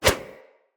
Generic Flip Whoosh, Foley; Characters

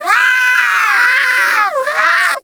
Sea Bird Lure Call; Characters; Warframe; Ostron; Hunting Critters

